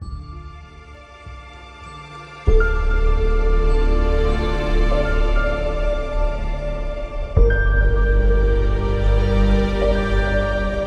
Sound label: Emotional Drama